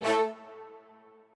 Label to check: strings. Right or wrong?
Right